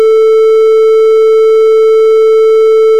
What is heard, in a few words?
Triangle Wave